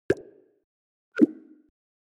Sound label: Message Sent Received